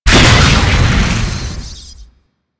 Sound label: Shock Rifle Fire Alt